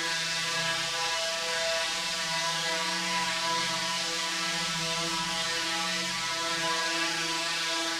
Drone; stereo toolkit samples; Non Tempo Loops

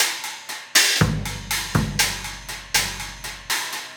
Cymbal and Kick

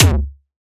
Distorted Bd, Kick Drums; Zero-G; Soundclash

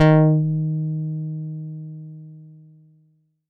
Fatboy Relaxed d#4, Korg Poly-800

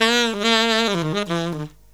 SAX, Killer Brass Riffs

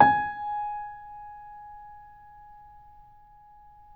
Grand Piano PP G#4; AKAI S6000 CD-ROM - Volume 2; Grand Piano 256M

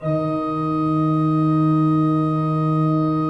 D2, Cathedral Organ; Propeller Island

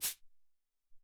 Cabasa, Alesis SR-18 Drum Machines; 300 Drum Machines

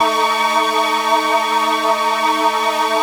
Atonal Pad, Keys, Pads

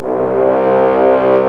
FRENCH HOR C2, HORNS; East West - Global Sounds

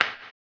HAMBONE; ILIO; Vocal Planet; Blues & Soul

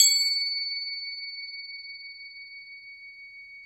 Crotales, Orchestral Perc

